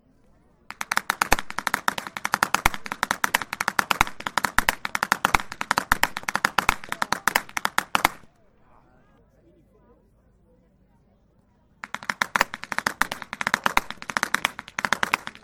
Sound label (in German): schnalzen, jugend